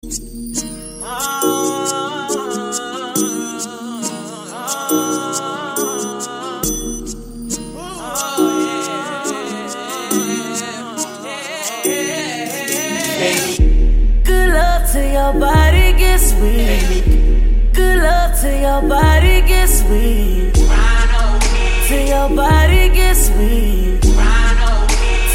Slow Grind Remix

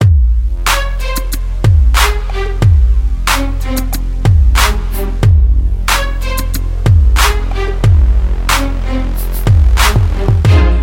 Message Tone

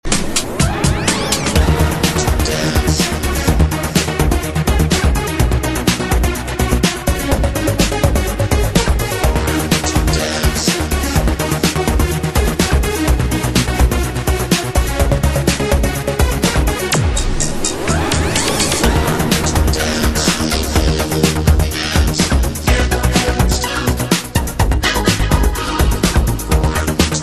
Electro Dance

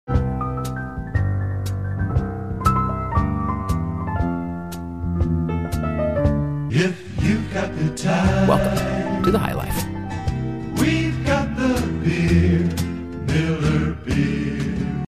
Highlife

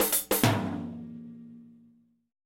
Percussion Alert

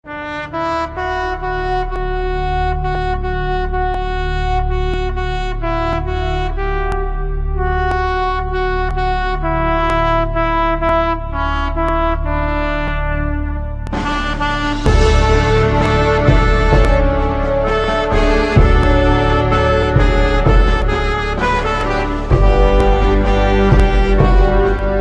Indian Anthems Music

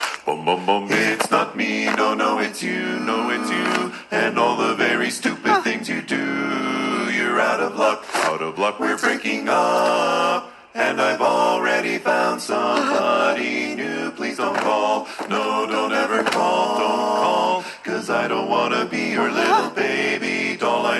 Barbershop Quartet

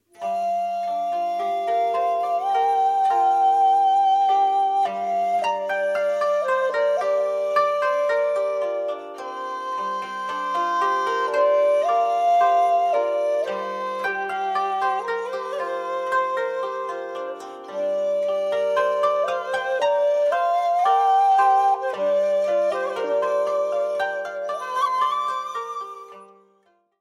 Japanese Flute

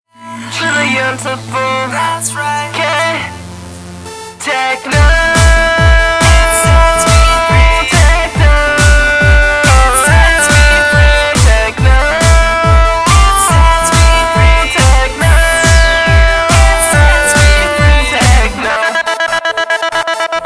Techno Trilla

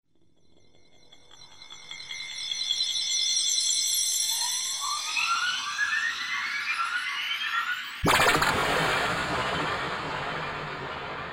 Scary Tone

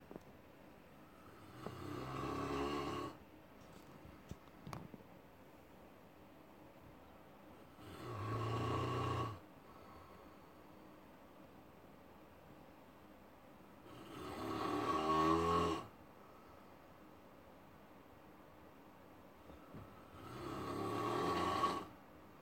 Snore

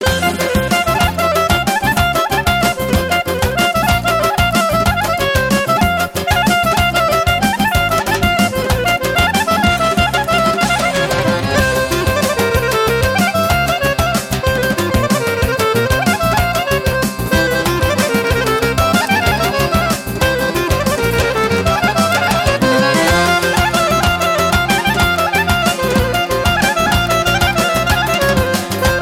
Armenian Melody

